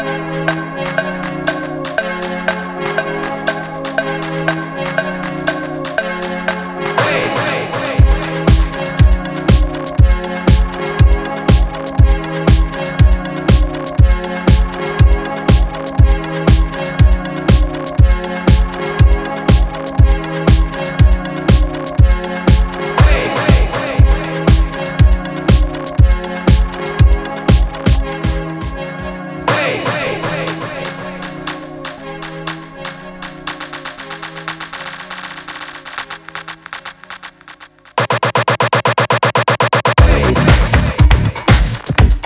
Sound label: Bass